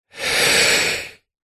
human breath out exhale slightly sinister close up; zapsplat